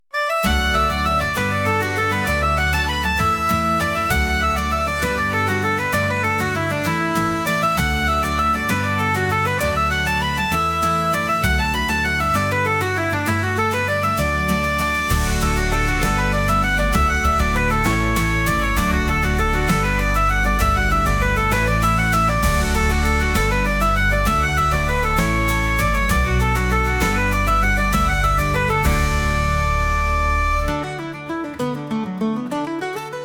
holiday revelry

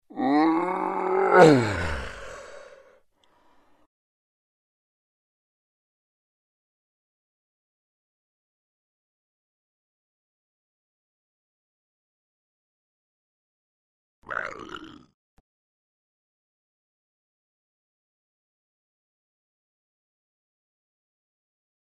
ogre sound

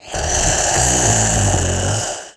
zombie

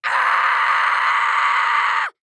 die sound

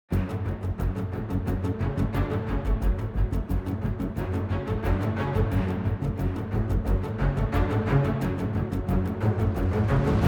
combat

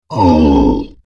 pain, troll

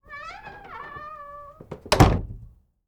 close door

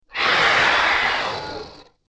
pain, dragon white tiny

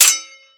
sword strike; weapons

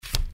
card flip; effects

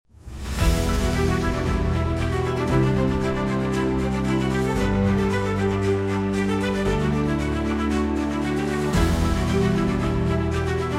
calm

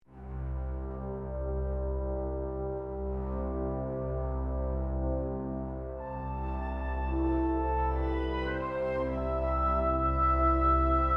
calm

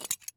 pickup, figures

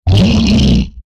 hit, troll

